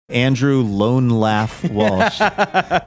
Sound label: lone laugh